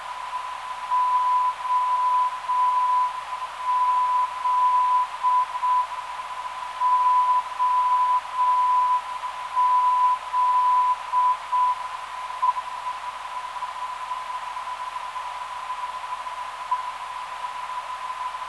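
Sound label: non-directional beacon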